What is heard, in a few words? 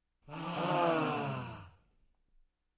Crowd Relief reaction